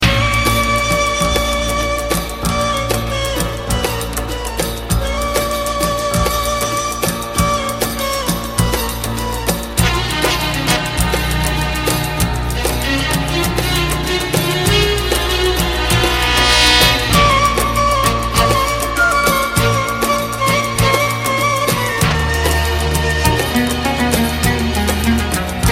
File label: Sad arabic classic